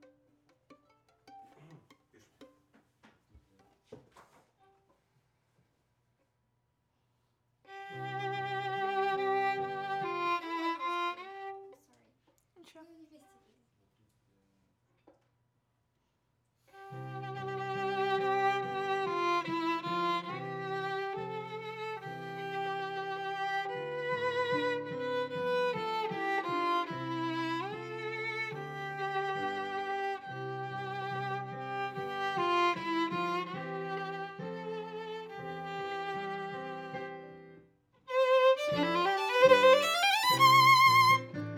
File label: Viola C; The way of the cross